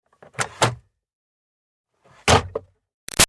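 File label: Car Highlander Glove Box Open-Close; 2005 Toyota Highlander V6; Vehicles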